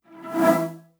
Flash

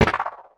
Alien Weapon Shot; Game Essentials; Sound FX Military

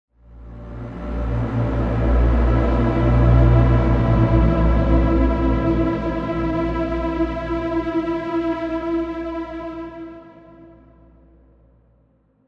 Tone

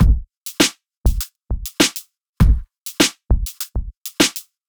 Drums 100 Crackled, Full Drum Loops; Ghosthack; Drum Mega Bundle Demo; Abstract Drums Volume 2

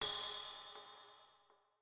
Cymbal; Modern Drum Kit; Pocket

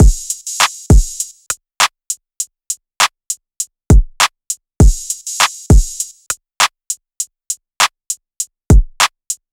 Drum Loop, 100 BPM, West Coast; Flow; Cymatics; GHOST (Beta Pack)

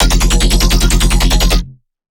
Melodic Bass F 150, One-Shots Bass Hits; Ghosthack; Color Bass Essentials

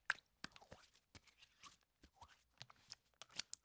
Hands Moist Wipe; Fairlight Sound Lib

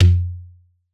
Percussion; Shockwave; Cymatics; House Starter Pack

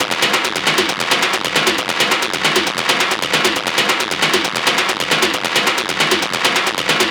Perc Loop 135, Techno

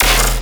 Bass Hit E, Dubstep; Glare Bass; Ghosthack; AC2024 - Day 13 - Dubstep Sounds 2025

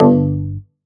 Bass Shot G

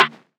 Percussion, Hip Hop, Drum One Shots

